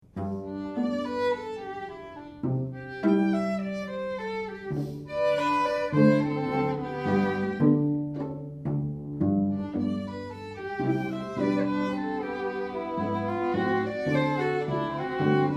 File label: Rag, string quartet